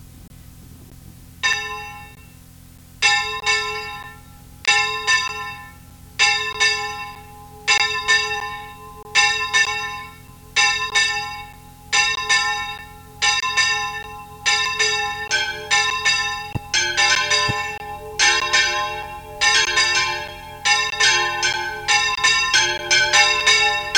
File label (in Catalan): campana